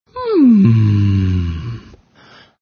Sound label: yawning; Oswald